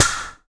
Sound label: CLAPPR; Spectrasonics; Liquid Grooves; WAVDRM HITS1